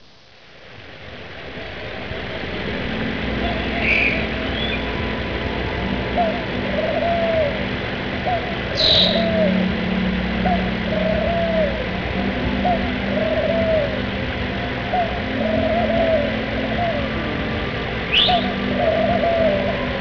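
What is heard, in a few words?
rainforest; Island Girls - Volume 2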